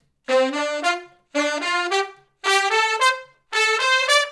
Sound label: R&B Riff (D), Horncraft, Sections